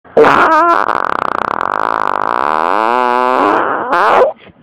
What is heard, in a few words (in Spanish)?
Pedo; de bellpuig